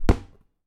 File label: Street Ball Hit Pad; SFX Kit